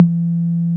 tom, Yamaha TG-500

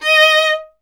viola D; Miroslav Vitous; Solo Instruments VOL-1

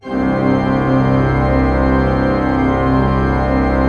C1, Cathedral Organ, PED VOL WERK; Propeller Island

